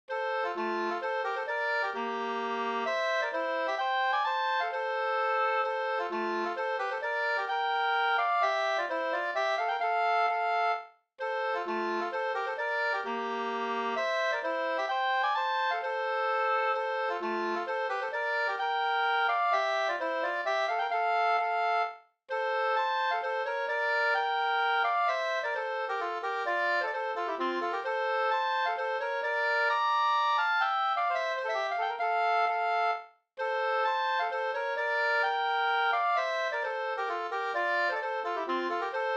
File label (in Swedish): hambopolska; Annas hambo av Anders i Logarn 2st